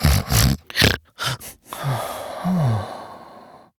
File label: Snores, Son, Vocalizations; Warframe; Deimos